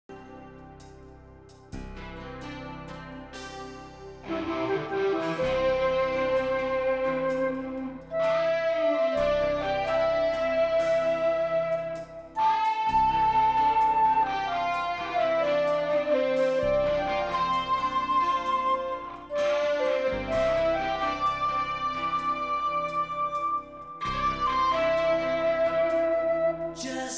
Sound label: Flute